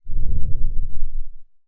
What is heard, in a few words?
monster breath; breathing